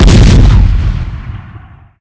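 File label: large cannon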